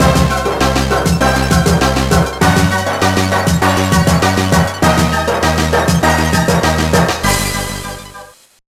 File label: Fanfare Blast Off